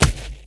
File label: sand land